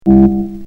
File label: FOG BASS